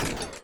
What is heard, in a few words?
Drill Landing; enemies